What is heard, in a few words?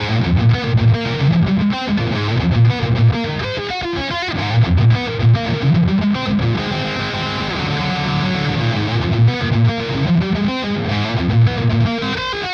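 Schecter Omen Elite 7 Bridge Gain, guitar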